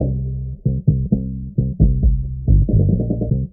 bass, Loops, Phonky

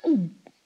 gulp

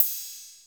Hats Electro Open

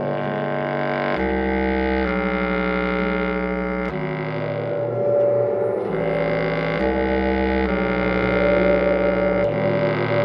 sax dreadful bends, sad; Loops

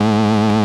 zap, Synths; Notes

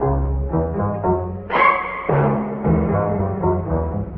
piano and strings doo la voo, loops, phonky

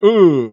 "mmm", Voice

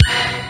electro

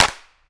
Clap n Snap, Snares

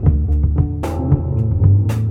bass

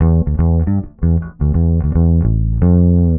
bass, Loops Phonky

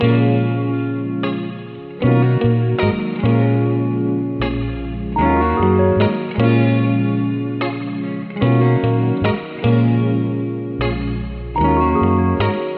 guitar and keys, Loops, Phonky

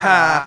ha

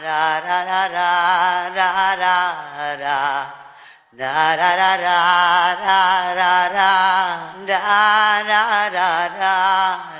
voice da dada dah; Sad

